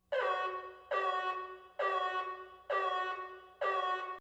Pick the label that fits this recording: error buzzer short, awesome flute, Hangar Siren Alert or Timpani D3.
Hangar Siren Alert